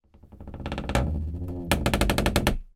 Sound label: household door creak wooden hollow